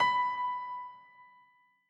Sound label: dial tone piano